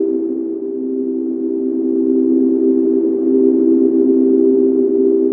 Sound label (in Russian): Whooshing, сэмпл фоновой музыки чиллаут